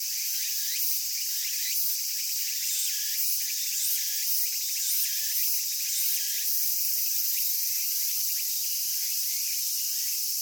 tsukutsukuboushi cry, animal

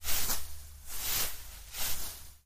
stick oharai

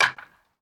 wooden bat

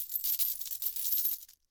money

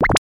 suck

